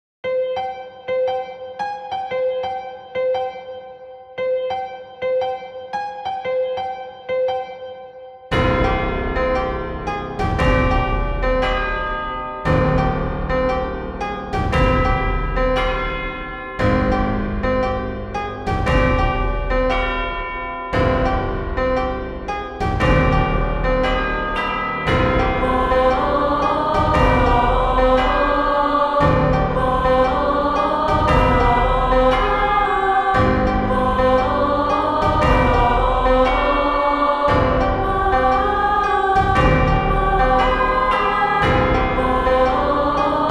Lurking Evil

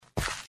walk right

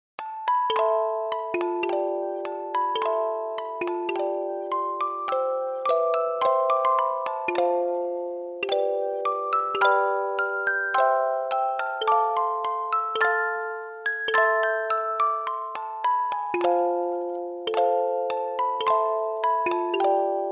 nursery

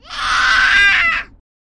scream

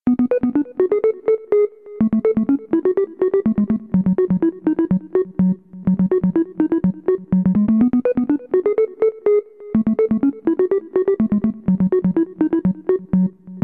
bg music

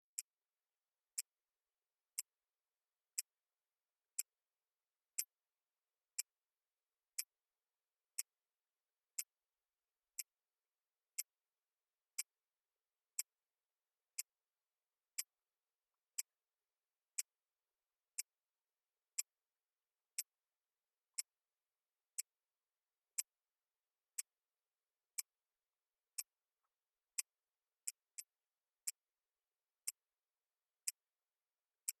tick

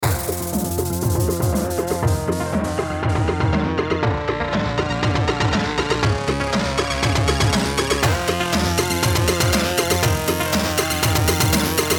gaming 8bit music

